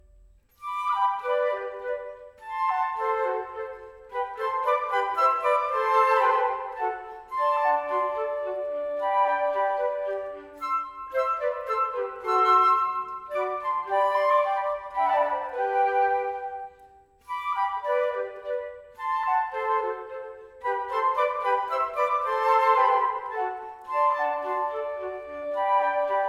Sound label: Notturno for 4 Flutes Trio